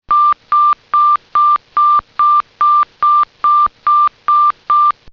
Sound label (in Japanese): kikenhaijyo yokokusingou